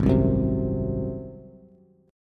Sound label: G sharp 7th, Bass; strummed; chord; JGuitar